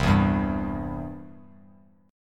C Major, Bass Standard; strummed; JGuitar

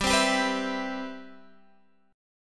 C Major 7th, G, guitar chord

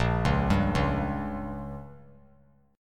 A sharp Minor 6th; arpeggiated; JGuitar

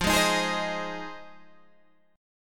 F Minor 7th F Guitar Standard; strummed; JGuitar

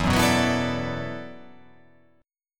E 7th Suspended 2nd Suspended 4th, down

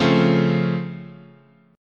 Db Augmented Major 7th, chord

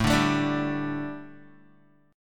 A 7th Suspended 4th A Guitar, chord; A7sus4 strummed; 4E,4A,5D,5G,5C,6F; 5,5,7,7,7,x

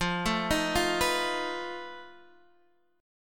F 7th Flat 5th, Guitar Standard; F7b5 arpeggiated; 13,12,13,10,12,x

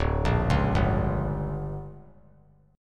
D Major 7th Suspended 4th Sharp 5th; DM7sus4#5; arpeggiated; JGuitar

